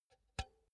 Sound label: pull out cinch quick; objekte; elektronik